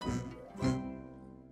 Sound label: funny dramatic outro